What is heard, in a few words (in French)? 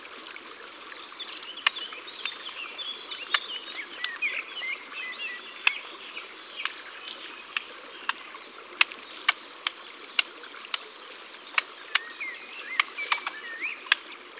Ecureuil; ABC de la nature